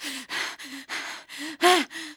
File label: female out of breath; Zomboid 41.78.16; Project Zomboid